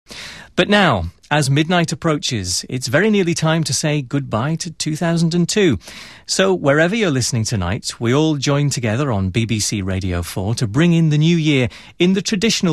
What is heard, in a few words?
chimes